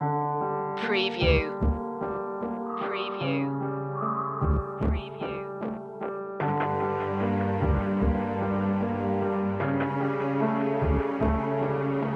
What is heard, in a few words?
no melody